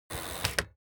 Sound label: transportation bicycle street brake hard